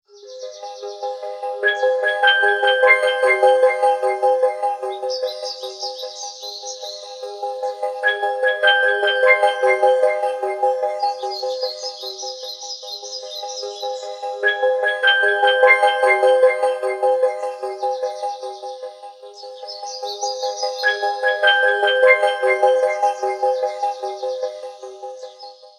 Atmospheric Forest, alarms; TCL REVVL 4 Metro

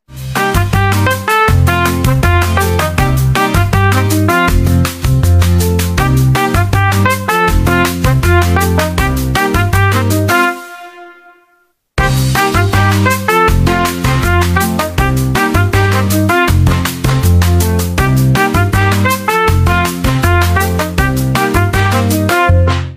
Salsa, ringtones

